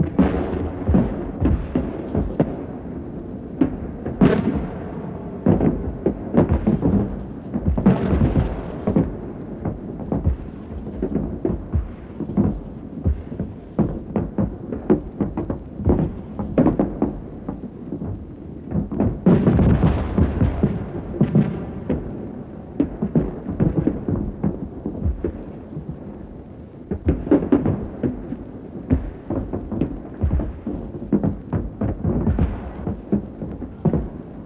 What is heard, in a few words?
Amb Destruction